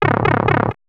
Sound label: Pipe Travel